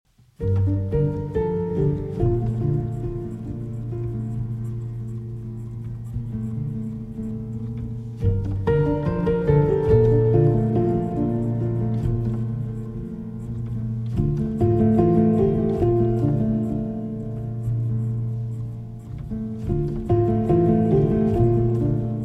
Grand Piano Version

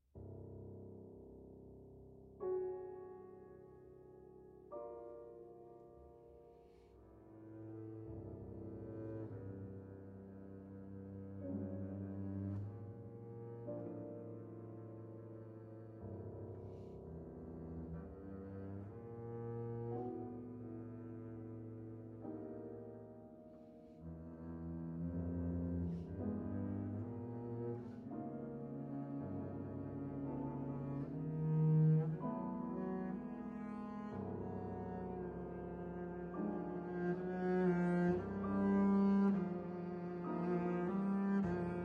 Double Bass and Piano; Tree-Shade & Summer Glow

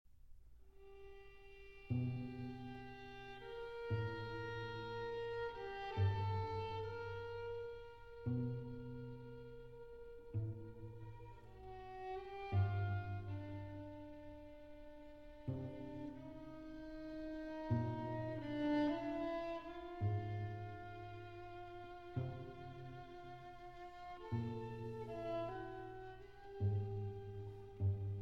String Quartet, Andante moderato

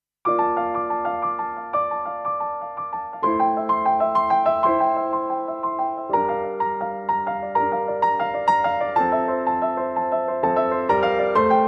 (Piano Version)

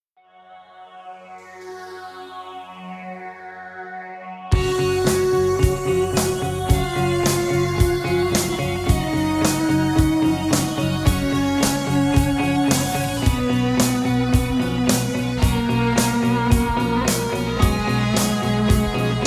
Atmosphere

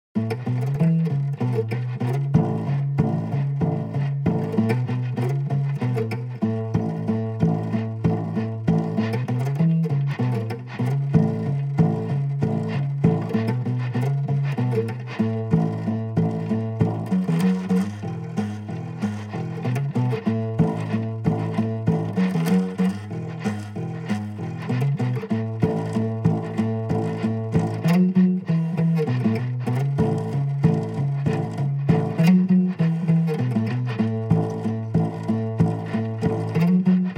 KunaBass Solo

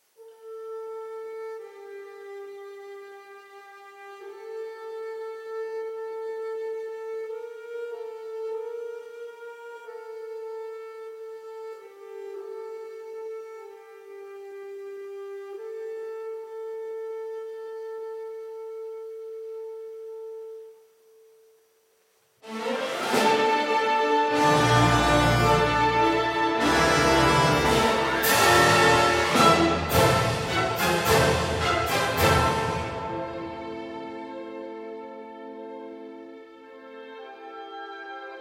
Symphony in A Minor Lento - Allegro Moderato

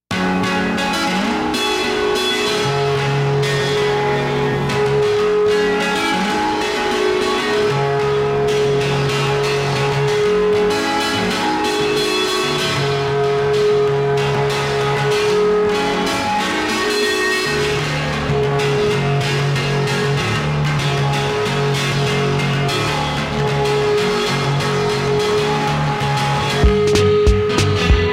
Instrumental

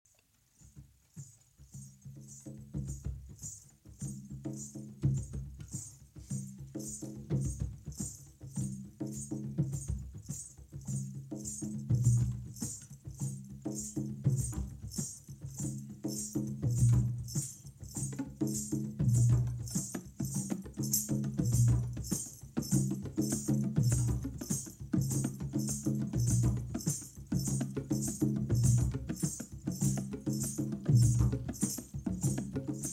Drum Talk

